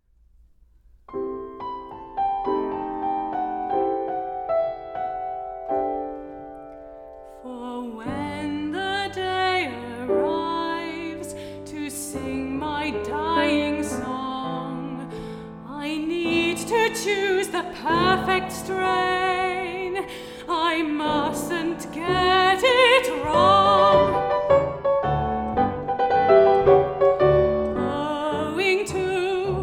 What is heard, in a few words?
vocal voices